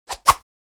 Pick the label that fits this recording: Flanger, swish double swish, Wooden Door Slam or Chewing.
swish double swish